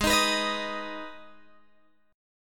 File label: G sharp Minor, Guitar, Open G; x,13,13,16,16,13